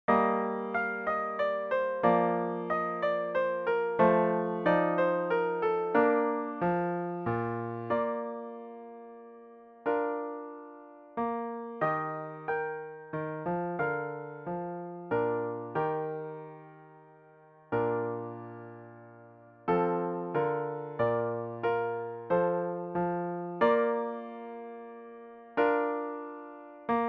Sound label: piano only; alto saxophone